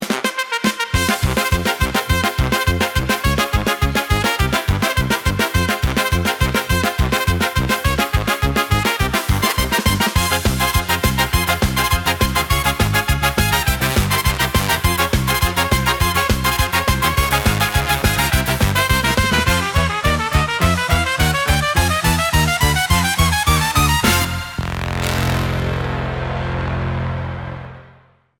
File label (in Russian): Кода с трубой, Jazz for piano